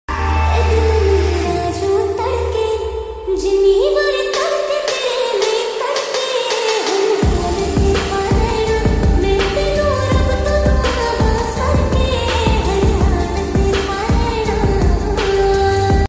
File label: song ringtone new punjabi song ringtone